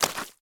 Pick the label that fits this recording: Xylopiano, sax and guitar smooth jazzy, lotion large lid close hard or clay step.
clay step